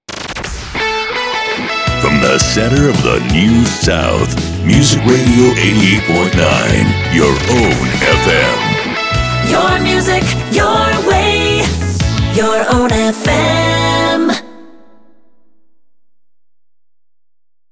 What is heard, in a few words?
jingles; yofm ignite